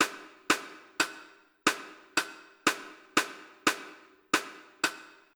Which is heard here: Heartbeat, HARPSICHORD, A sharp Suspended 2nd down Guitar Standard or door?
Heartbeat